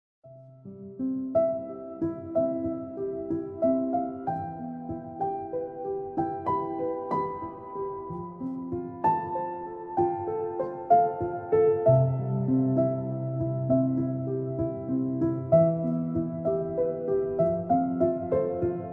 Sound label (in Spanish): Música Relajante Piano Música Calmante